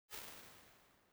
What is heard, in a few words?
Large Hall; impulse; UVR resources